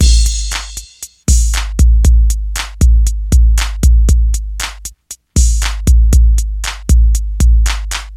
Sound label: 118 bpm modern hip hop drum beat A key, kick tuned to the A note